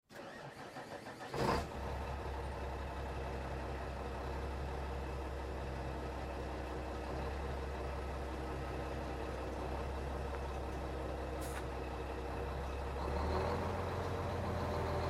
Semi Truck Mac, Semi Truck Mac E7-460 Start Up Drive Off; Semi Truck Mac E7-460 Start Up Reverse Drive Off; Industry; Trucks; Blastwave